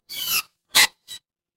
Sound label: cartoon squeak